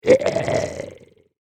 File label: Evil Gurgling Zombie; Zomby Sound Effects; horror sound effect